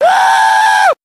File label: "woo", voice